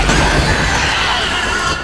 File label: die